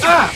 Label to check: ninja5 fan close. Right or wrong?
Wrong